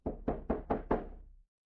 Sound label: door knock